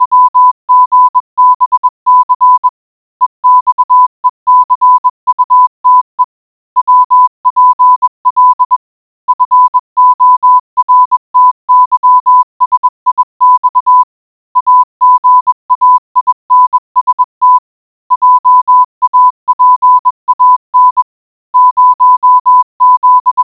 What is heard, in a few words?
morse code